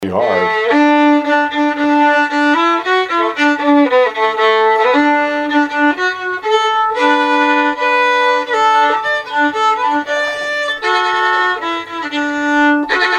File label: lost indian d slow, fiddle